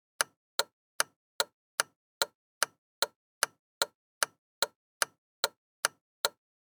Car interior turn signal loop

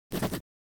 Scribble signature on paperwork

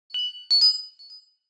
Bright notification alert

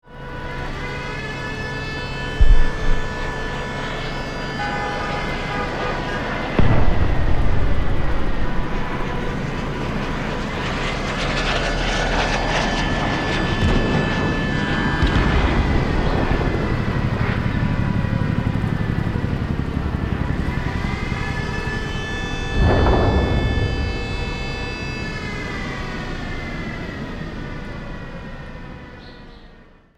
City emergency sirens and explosions

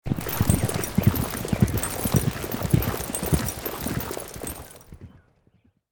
Galloping horse saddle and bridle

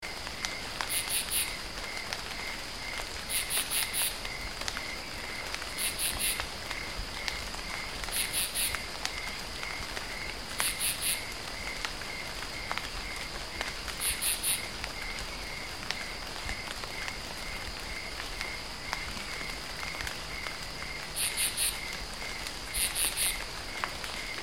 Light rain forest ambience pattering loop

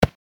Hand pat on sweater; misc human

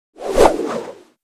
Rope swish fast whipping

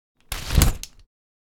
Small umbrella open

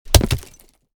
Hard soil rock pieces thrown on rock bricks; gfxsounds

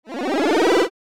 Line graph rising animation infographics

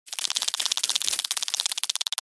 Small creature monster pass by

